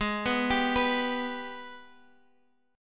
Minor Major 7th; Gsharp; x,x,x,x,8,6,9,8

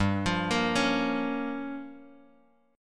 G Minor 7th Flat 5th, Guitar DADGAD

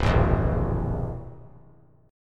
C Augmented 7th C down 3C, 3F, 3A, 3D; strummed; JGuitar

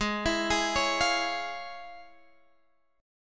F Minor Major 7th Sharp 5th, Ab

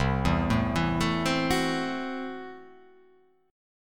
C 6th Flat 5th C down

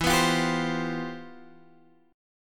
C Major 11th E

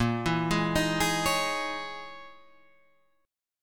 C sharp Minor 6th Add 9th A sharp

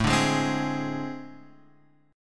A Minor Major 7th Double Flat 5th, down

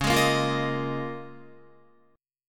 Db 6th Add 9th Db down Guitar; Db6add9; strummed

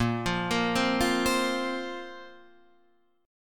A sharp Suspended 2nd; A#sus2 Chord {6 8 8 5 6 8} arpeggiated

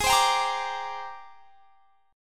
Ab Major Sharp 11th Ab down; AbM#11 strummed

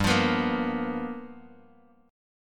G 7th Sharp 9th G chord, Bass Standard; G7#9 strummed; JGuitar

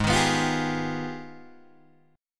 Ab Minor Major 13th, down, Guitar, DADGAD

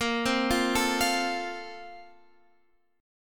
A sharp Major 7th Suspended 2nd; arpeggiated

